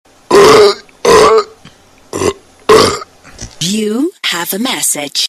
mensagem sms arroto

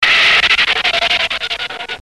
freiada de desenho; desenho animado

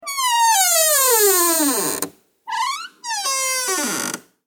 porta abrindo efeito sonoro

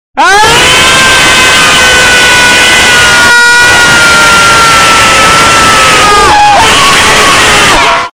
ahhhhhhhhh, barulho de grito ahhhhhhhhh

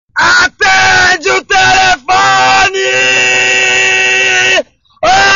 Atende Grito; gritos de atende; toques engraçados

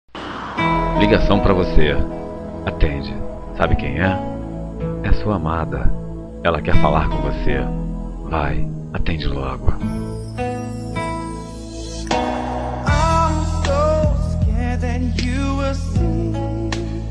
toque romantico brega

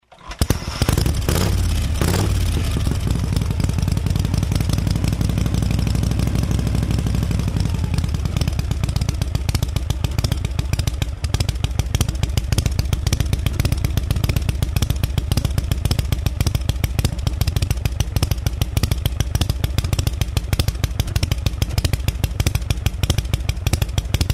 Harley-Davidson motor, som de moto acelerando

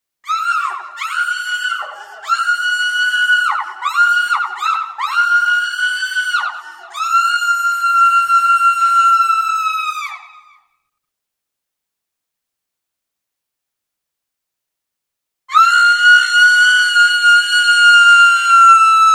grito de mulher; gritos de mulher

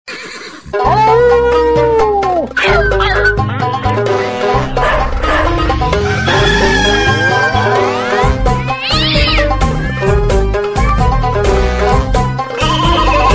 toque para celular som de animais

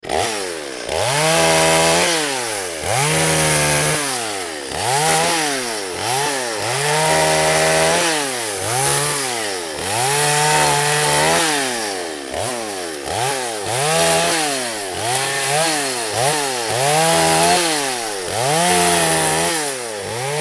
cortando árvore com motosserra

